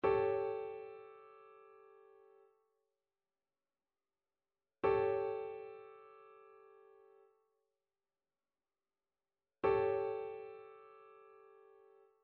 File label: trichord; trichord identification